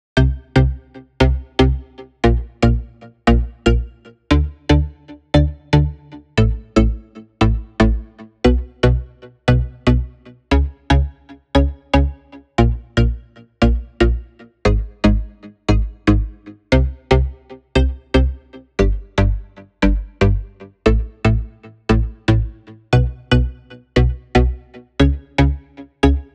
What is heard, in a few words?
All BPM Style Dholki Beat